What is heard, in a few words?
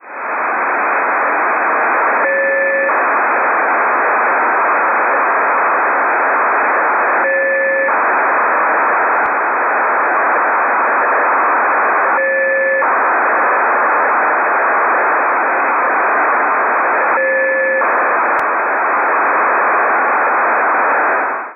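NAYIF1, satellite; AIRSPY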